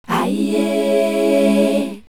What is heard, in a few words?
chor ayee